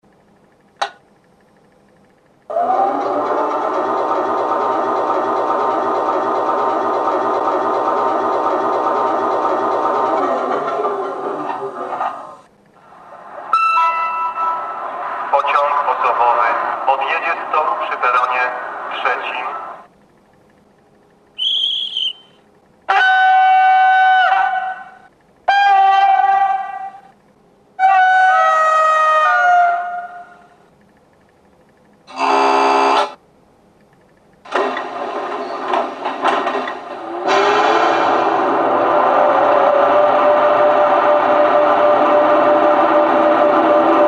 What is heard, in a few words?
EN57 Sound